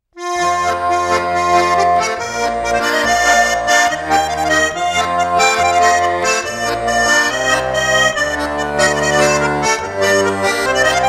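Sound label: naigrysh on Petersburg garmon; na rechenku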